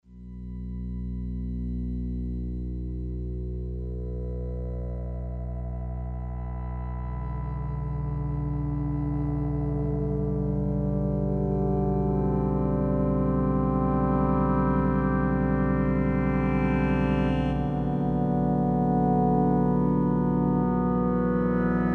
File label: Meditation